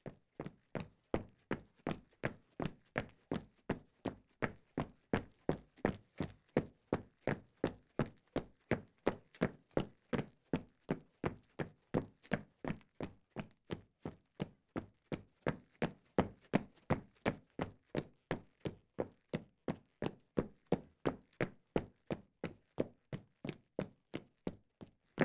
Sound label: Footsteps Run Hard Floor